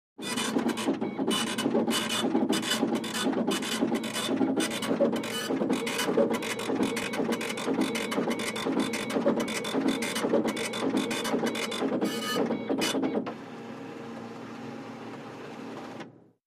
Computer Printer Pri, printers

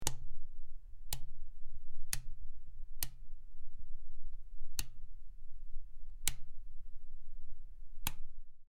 Rotating Switch Clic

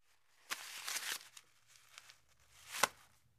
Napkin Crush Toss Paper

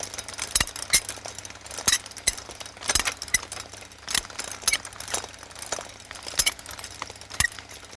Roof Vent Squeaky Ro, gears

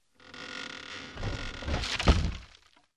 WOOD BREAK; crashes & creaks

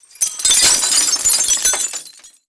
Glass Falls Light; windows & mirrors

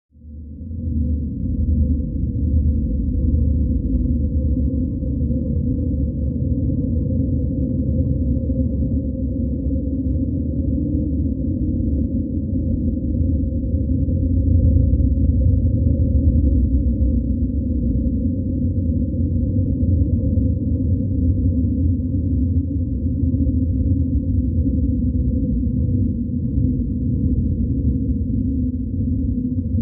Bed Impending Galact

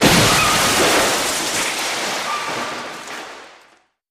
Metal Impact Skid, metal impact

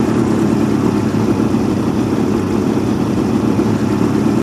150 hp Mercury Boat Drive Loop Driving, On Board

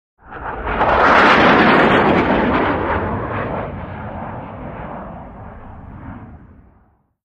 Fighter Jet Flyby Med; fighter jets

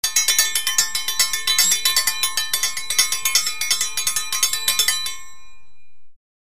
Triangle Random

